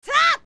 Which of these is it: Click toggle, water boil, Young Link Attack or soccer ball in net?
Young Link Attack